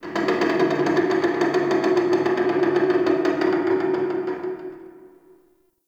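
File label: Metal Creak Distant One Off; Ambience; Warframe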